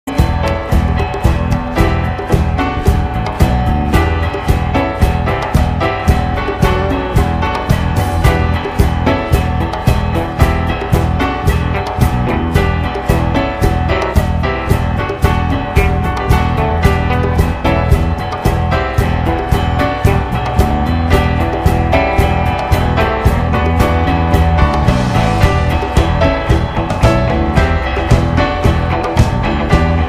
Electric Improvisation

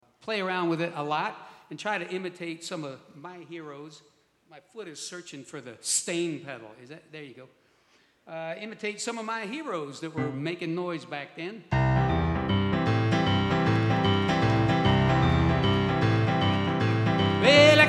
Piano Moment

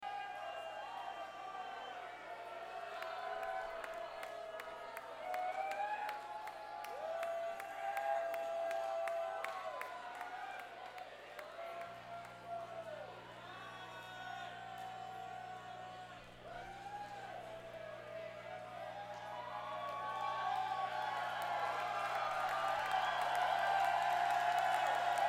Applause

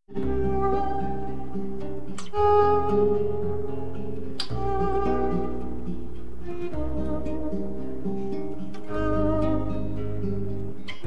Prelude in C Minor

